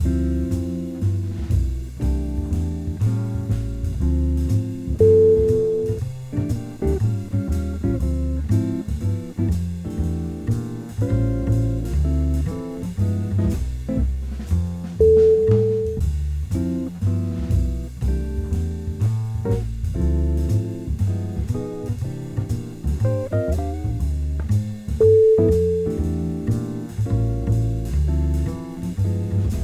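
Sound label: cocktail party jazz, music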